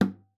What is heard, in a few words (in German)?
KLOPF, GUITAR FX; Xsample VOL-2